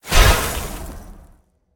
ice activate; smite; merlin

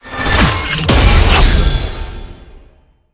aoe explode; smite; tiamat

